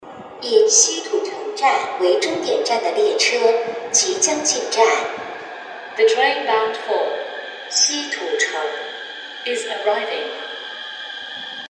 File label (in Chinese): 昌平线 西土城 终点